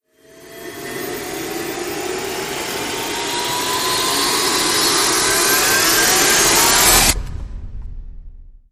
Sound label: Rise Short; Rise Short Phase Shift